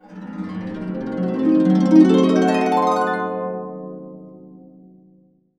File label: C DIM7 CA UP, CASCADE UP, Complete Harps; USB Soundscan vol.62; AKAI